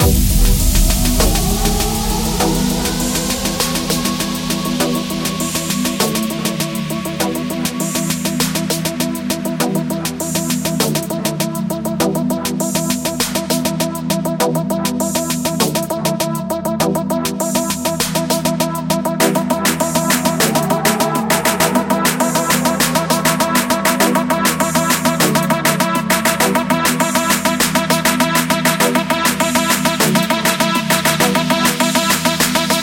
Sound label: Full Loop